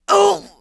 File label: HURT; TrueCrimeStreetsOfLA; XBOX